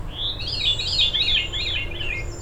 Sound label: Veery song